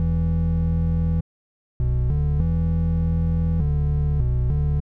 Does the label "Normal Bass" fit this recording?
Yes